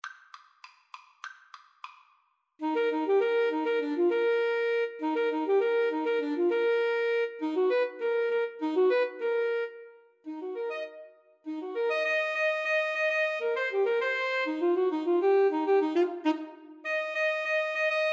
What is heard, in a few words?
ASAX; Alto Saxophone Duet